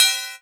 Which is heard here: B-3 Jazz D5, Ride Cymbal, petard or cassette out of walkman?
Ride Cymbal